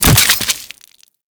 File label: Cluster Explosion; Uzi; Warframe; Infested